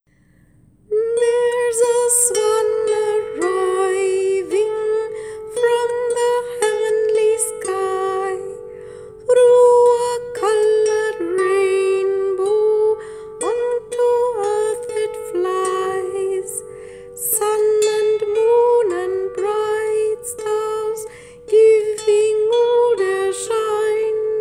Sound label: sound bowl voice